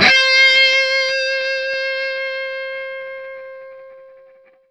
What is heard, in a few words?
SINGLE C, Vintage Blues Guitar, ELEC.GTR; USB Soundscan vol.22